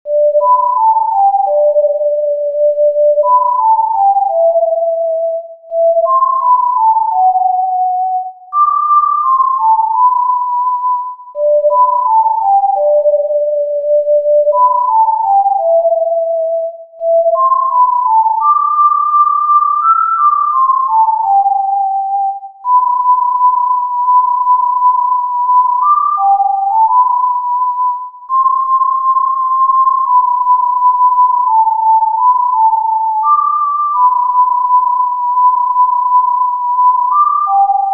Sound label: Jingle Bells in G Major for Tin Whistle